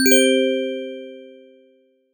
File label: success